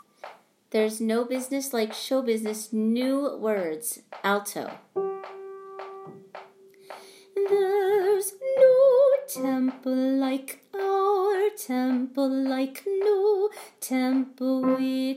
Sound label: Alto; Broadway Shabbat